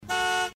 hupe

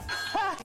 lachen; astrotv

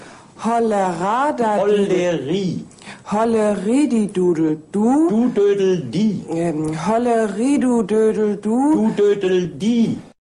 jodel schule

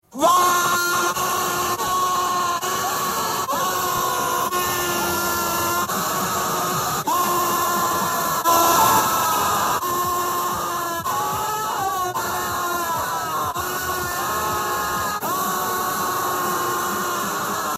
ausrasterrr

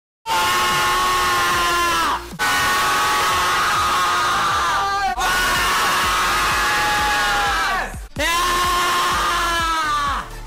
schreie

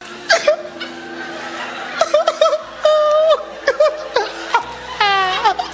lachen

